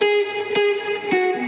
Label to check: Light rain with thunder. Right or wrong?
Wrong